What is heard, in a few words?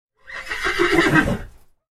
horse neigh